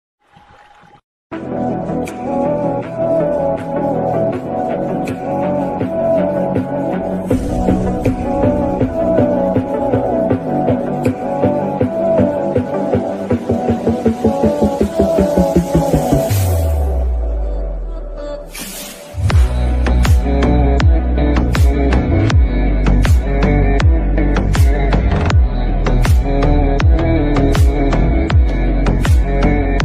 bgm ringtone, hindi ringtone song, dharmik ringtone, flute music ringtone